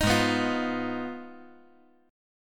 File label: Ab Minor 6th, Ab; Abm6 strummed; JGuitar